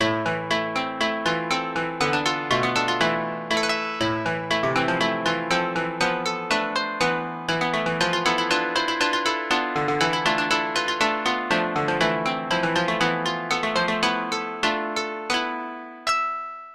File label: BGM Japanese; Yuru Yuru Gekijou Gekijouban 2 3